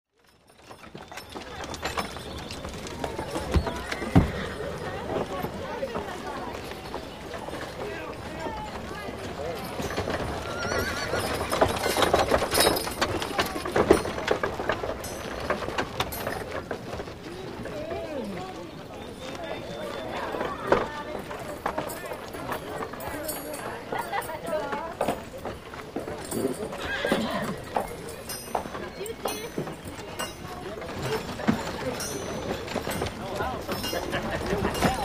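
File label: wild west sounds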